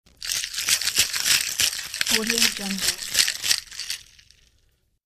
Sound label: ice in cocktail